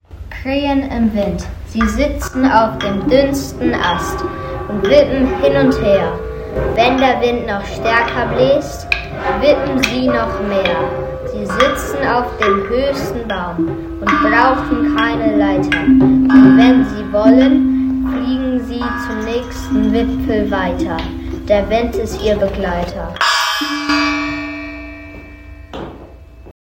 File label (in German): Kraehen im Wind